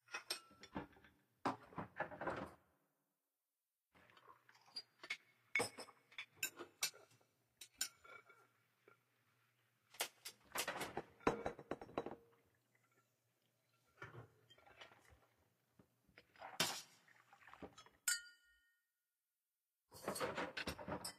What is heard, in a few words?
ambient city tavern